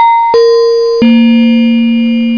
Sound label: Glass